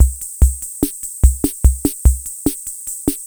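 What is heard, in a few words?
ROCK, drum machine; Crypton - DrumBase5000; TR-66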